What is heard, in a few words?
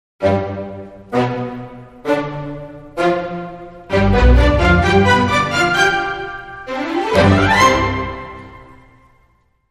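Dramedy Sting, No Percussion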